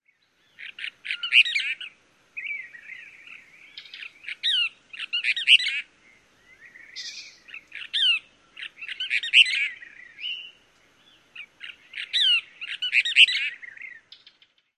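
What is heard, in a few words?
dawn song, Cassin's Kingbird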